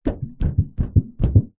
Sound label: vehicles car bonnet hood pressure press hit bend footsteps